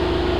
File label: RUN ENG